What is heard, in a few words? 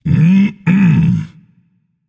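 non-verbal content; smite; atlas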